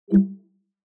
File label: save, audio cues; gitlab-vscode